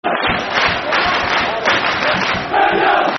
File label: Rangers!, Football Chants; Rangers GRFC